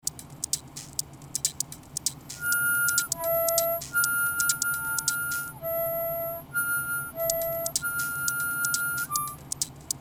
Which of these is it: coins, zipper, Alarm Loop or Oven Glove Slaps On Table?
zipper